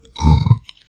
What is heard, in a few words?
sudden hollow skull roar, teeth snap